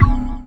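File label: organ soft, Stabs, House; Oneshots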